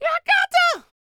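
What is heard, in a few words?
falsetto, R&B Dance; Spectrasonics Vocal Planet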